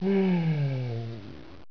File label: SIGH